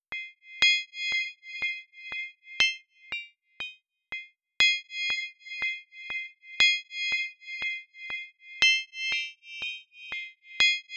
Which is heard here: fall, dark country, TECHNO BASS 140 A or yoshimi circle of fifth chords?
yoshimi circle of fifth chords